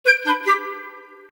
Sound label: Email Capriccioso; Vertu Constellation Quest